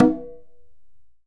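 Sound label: Conga Open; Digital Sound Factory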